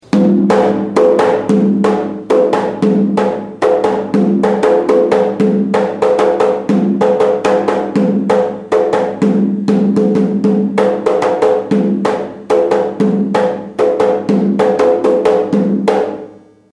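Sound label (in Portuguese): ijecha, atabaque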